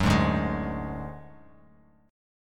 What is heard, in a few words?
D Major Flat 5th D Bass Standard, chord; strummed; JGuitar